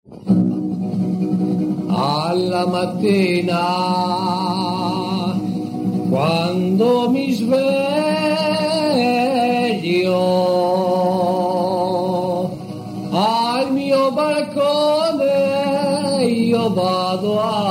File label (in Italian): canti; alla mattina quando mi sveglio